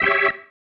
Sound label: Stab, Organ, One Shots